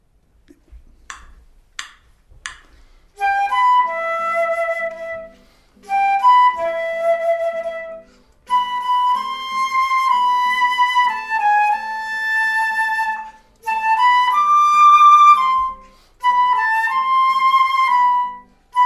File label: flute; version simple, flûte seule (pour placer la guitare, simple ou normale)